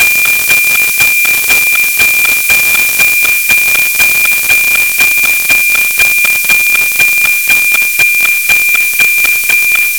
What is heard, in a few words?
noaa19; apt-decoder